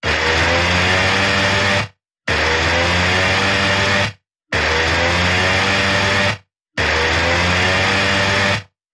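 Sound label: Timekeeper Battle Stations (80)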